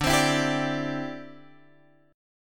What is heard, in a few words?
D 7th Suspended 2nd Suspended 4th, Guitar Open F; D7sus2sus4; strummed